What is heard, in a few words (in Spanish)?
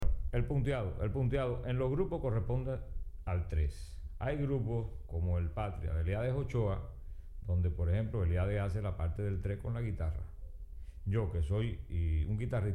punteado